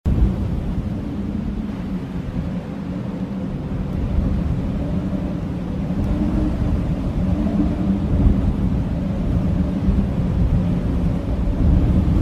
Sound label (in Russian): snejnaya purga viuga